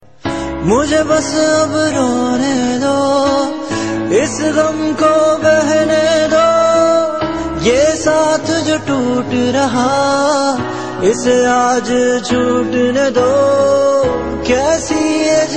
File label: sad ringtone; jannat; galaxy ringtones